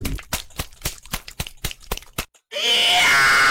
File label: sus clap